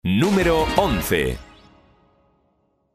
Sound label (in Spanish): jingle